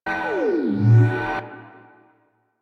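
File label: Research Facil Crane Move Vertical Loop; Venus; Objective Rooms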